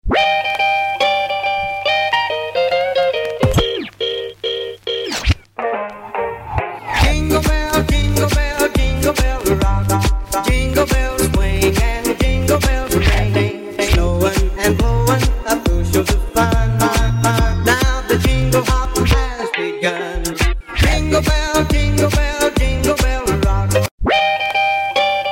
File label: Rock Remix